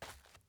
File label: dirt step; teardown; ps5 windows xbox series xs